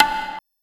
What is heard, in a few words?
soft hit normal